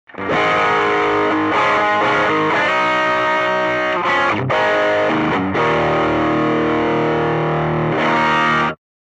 ZW-44 w Clean amp

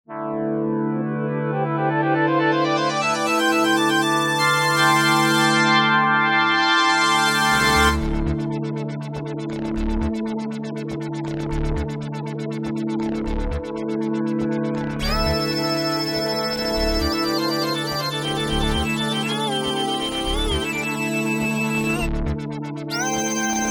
mini moog

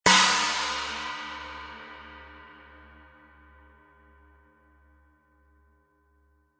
CH, CHINA

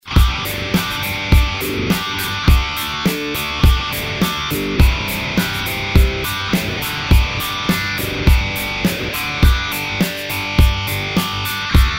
Random Filter

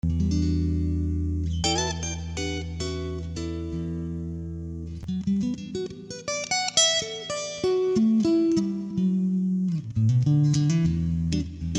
Acoustic Tone

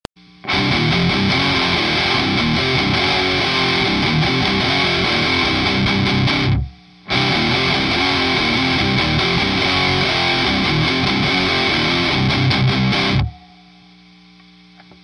Cyclone Dirt Flat EQ

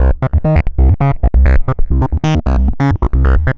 bass; ultimate elec 2

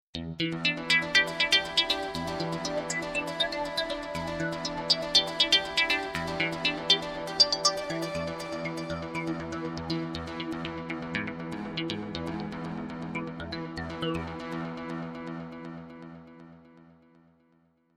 Funky Filter Guit FX

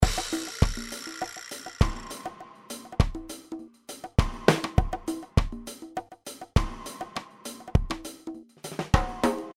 70s reggae

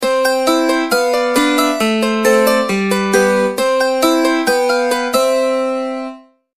Hammered Dulcimer

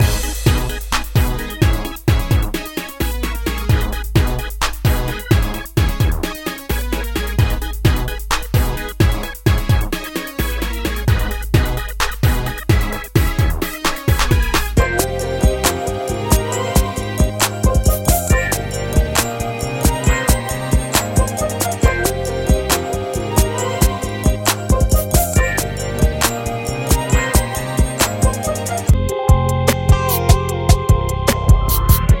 Derty South

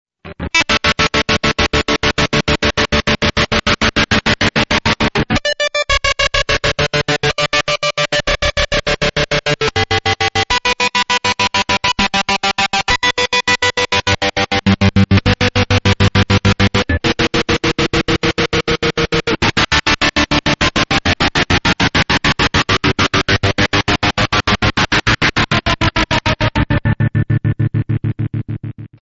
heavy trem

